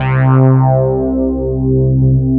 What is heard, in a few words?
MOOG; Rubber Chicken Software Sample Bank